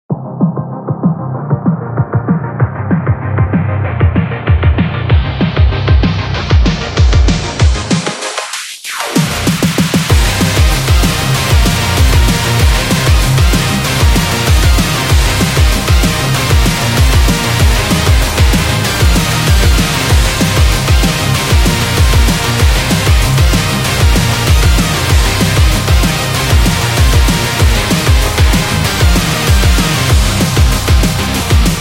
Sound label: Game Rock; gym rock